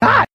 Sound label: "ha", Voice; beavis